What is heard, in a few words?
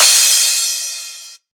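cymbal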